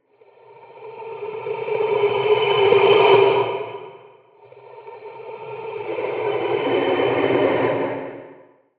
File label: horror monster roar growl reverberant; fork media